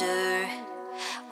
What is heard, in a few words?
vocal